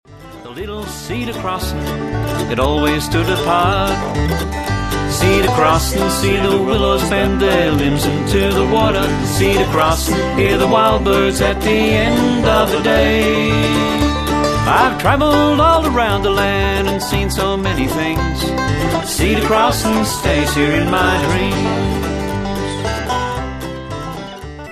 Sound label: bluegrass